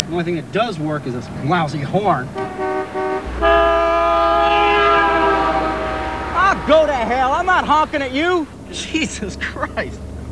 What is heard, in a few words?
honk